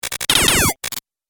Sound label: Laser Blasts And Charging Up; Laser Gun Sound Effects; Sci-Fi